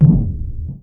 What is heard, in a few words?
doors